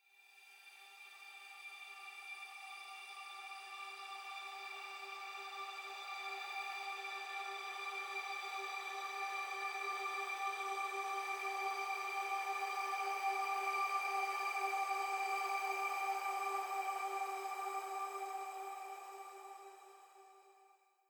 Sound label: Future Bass Chord Pad Hi